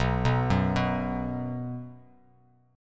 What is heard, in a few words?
B 5th B down Bass Standard; arpeggiated; JGuitar